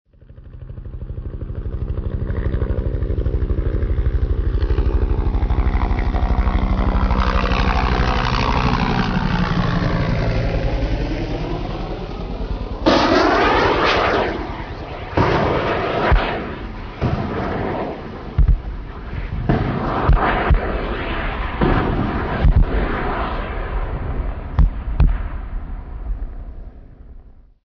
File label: Helicopter attack; Airplanes helicopter flying